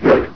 Giant Attack

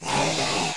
Soldier Attack

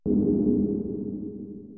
Dark Critical

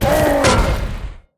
death

